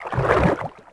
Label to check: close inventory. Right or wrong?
Wrong